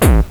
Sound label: BAD BD; DRM PERC